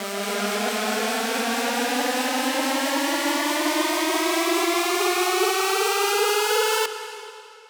Riser 140 BPM G; FX; Future Bass; Risers

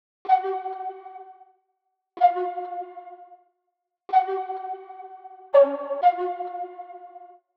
125 BPM A# Min Flute; Stems; Cymatics; 2022 Melody Collection; Tantra Pt 2

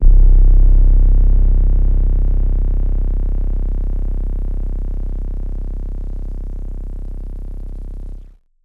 Synth Bass C, Lo-Fi One Shot; HipHop; Melody Kits; Ghosthack; Home 74 Dm

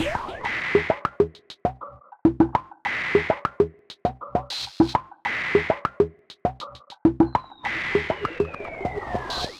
Percussion Loop - 100 BPM; Jokes; Cymatics; ANALOG EVOLUTION Beta

